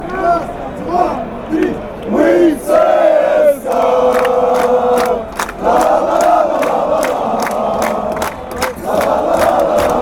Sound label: cska chant